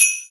xmas sleigh bells

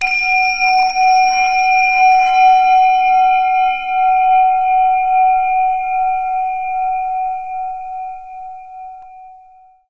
zen tingsha; Source Engine; tf; japan fundraiser

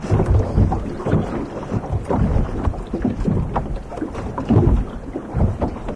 pontoon stopped water loop, airboat; Source Engine; video game; vehicles

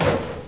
g bounce, weapons; source engine; hl1

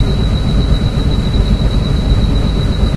turbine, ambient; Source Engine; tf

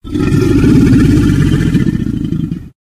crocs growl; Source Engine; tf; lair

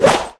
sword swing; tf

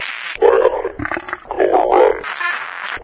zombine alert; npc; source engine; episodic

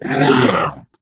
ballbot hello

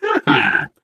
ballbot hi

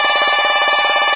zip machine; ambience

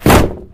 cardboard box break

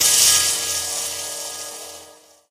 lightbridge elec, footsteps

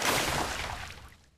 draw madmilk; tf

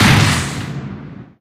giant common step; source engine; tf; mvm; video games

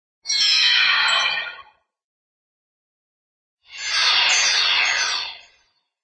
magic spell chimes; cartoon

